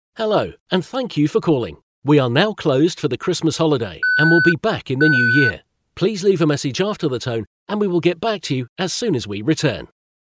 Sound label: Christmas with Tone Watermark